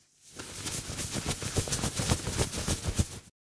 Clothes jacket running movements

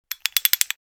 Metallic clinking rattling short

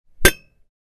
Metal impact thump

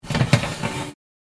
Kitchen oven oven tray slide; cooking

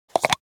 Plastic container open; gfxsounds

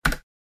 Keyboard key press mash

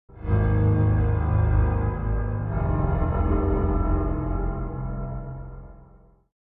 Spooky piano strings

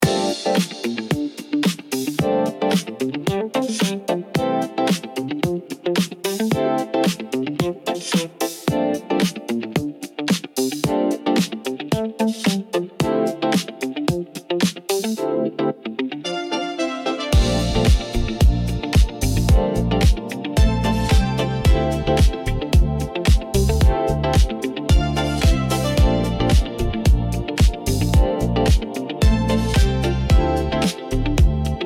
Funky groove hypnotic disco drift

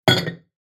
Glass mug placing on shelf; utensil

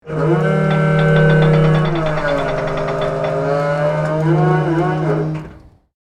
Tank turret moving turning mechanical noise; artillery

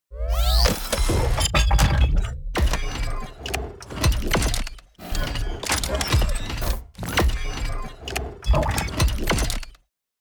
Sci-fi repair kit cybernetic futuristic

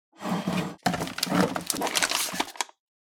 Fishing tackle box

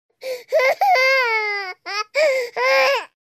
Baby crying hoarse breathing

